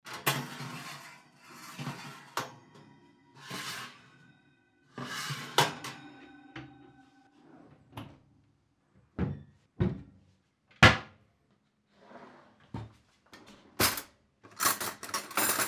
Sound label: kitchen noise